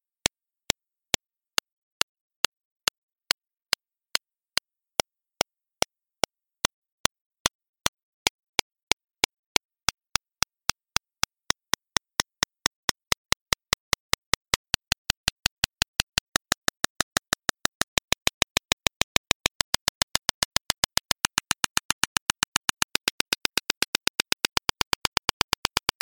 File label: click track for ultrasound